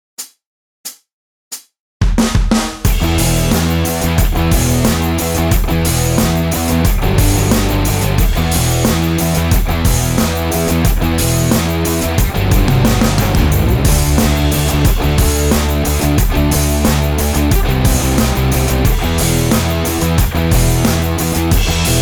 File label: intense